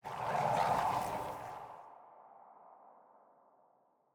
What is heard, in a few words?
intro kindred mountains; lol